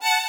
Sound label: violin